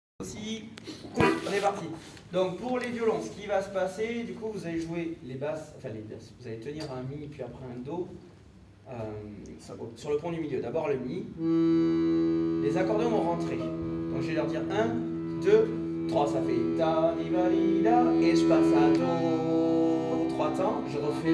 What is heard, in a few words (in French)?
montee violon; Musique d-ensemble